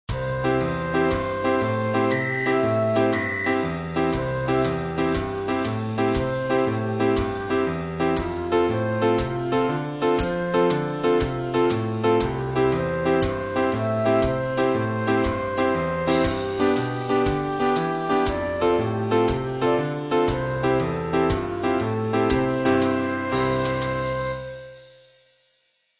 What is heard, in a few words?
melody